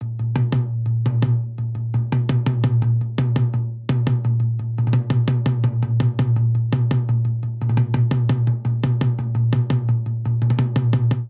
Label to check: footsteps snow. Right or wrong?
Wrong